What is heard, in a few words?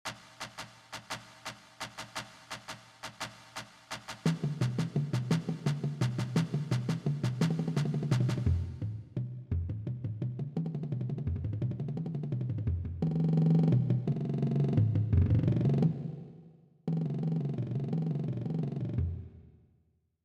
Bass excerpt